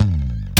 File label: GTR; ZERO-G; Datafile